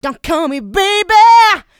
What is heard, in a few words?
voc, female vox, Vocal House